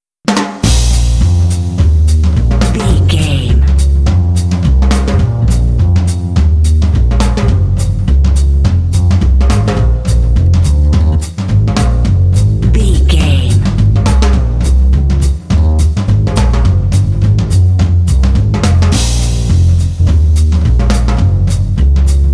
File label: jazz django swing